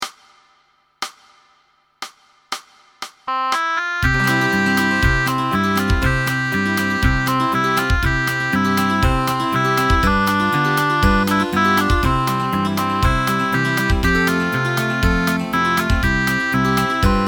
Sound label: saints basson